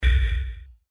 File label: Bass Bump